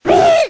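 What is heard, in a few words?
ram attack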